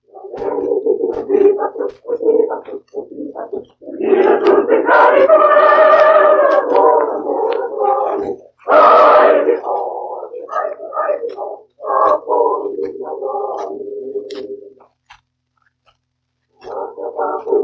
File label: Youth Choir singing; Coedpoeth; Robin Ddiog; Lazy Robin